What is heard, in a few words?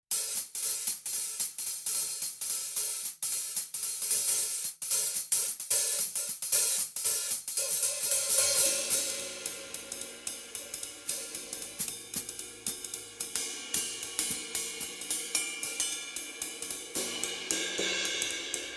Soultone Vintage Old School